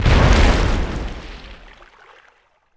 Destroyer Fire; Civ4 warlords

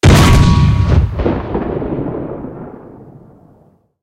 cannon 128mm pak44 shot, cannons tanks; War Thunder

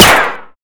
Projectile Sounds; Red Orchestra 41-45

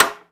Aircrafts MiG-15bis Ratchet; DCS

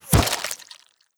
flesh bullet impact; Rust

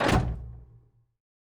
Aircrafts Gear Steer Lock; DCS

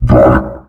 commando punch

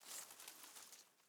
leaf rustle twiggy soft

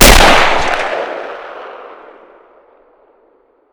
Inf Weapons; Red Orchestra 41-45

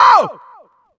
high scream end

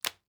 ui drop meat; Rust

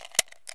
mosin round load; Escape from Tarkov

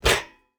footstep burlap metal jump land; Rust

